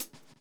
123 groove; AMG Gota Yashiki Groove Activator

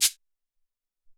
Cabasa, Alesis SR-18

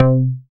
BAS MKS-50, Roland

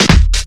COMBI, Houseworx; Ueberschall; Workz-9

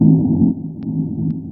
loop, techno atmos

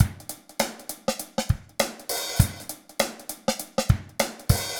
100 EASY, LOOPS; Best Service; Hyper Dance

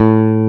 E BASS A1, Bass Guitar, STACK BASS; AKAI S6000 CD-ROM - Volume 1

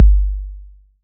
808 bass drum tape; Goldbaby Tape808 & Tape909; The Tape 808

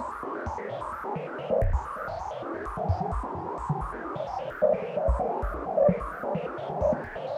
Moving Noise 130, Tempo Loops 130bpm

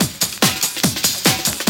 LOFI SLAM, DIRTY DR LPS

